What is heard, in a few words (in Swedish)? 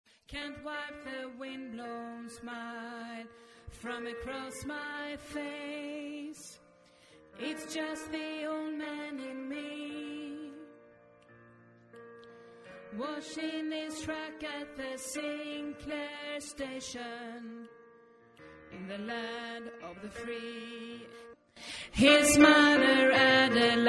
sopran, stammor